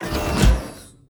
open door

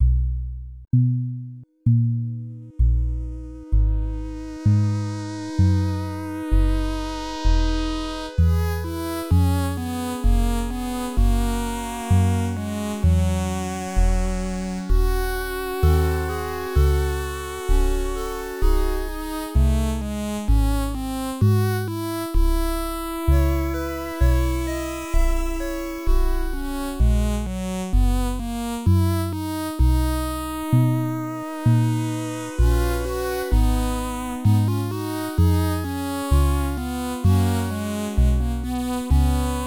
Air (On the G String), genesis; Asterix and the Power of the Gods

